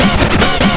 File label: scratch; Interactive Media Design Review 1999